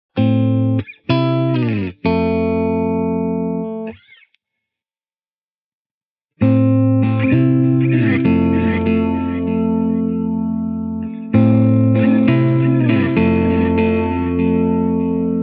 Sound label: Lofi; echosystem